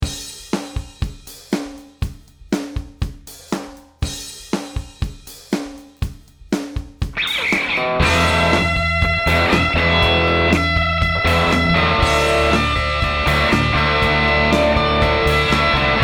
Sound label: homebuild les paul